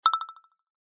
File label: bamboo, phone; gmod; paris